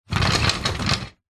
household toilet paper roll holder wall pull unroll plastic